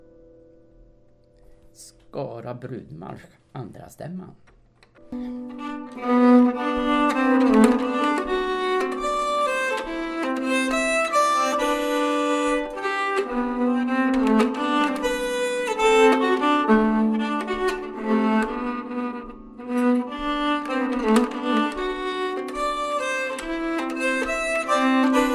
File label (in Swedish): brudmarsch stämma; Skara